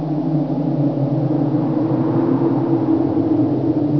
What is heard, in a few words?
Graveyard Wind